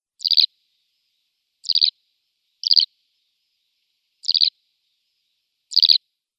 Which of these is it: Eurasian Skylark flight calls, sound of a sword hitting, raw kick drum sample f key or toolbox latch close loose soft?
Eurasian Skylark flight calls